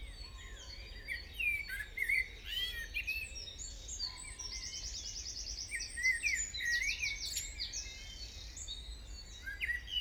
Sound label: dawn chorus, wildlife